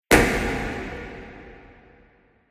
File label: Arcade Game Deep Dungeon Hit, retro; video game